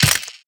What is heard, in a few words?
weapon c4 impact; physics; weapons